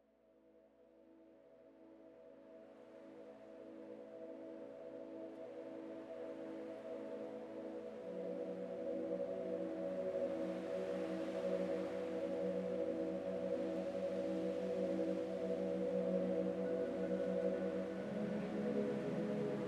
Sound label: Garden Grind; Pro Sound Effects Library